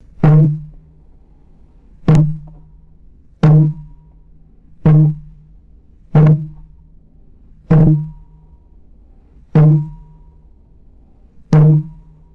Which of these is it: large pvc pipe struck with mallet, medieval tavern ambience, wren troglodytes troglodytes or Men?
large pvc pipe struck with mallet